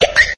hiccup

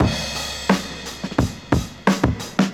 kill the cymbal, funky drum loops

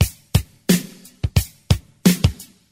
briskly, Boombap, Drum loops

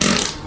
fart short

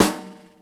snare, Real

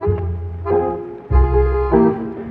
keys

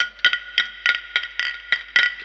bottles, Drum loops

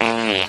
fart medium

